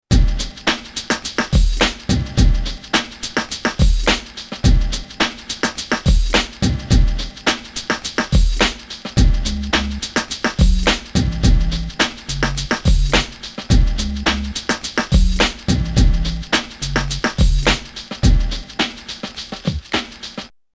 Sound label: Oldschool break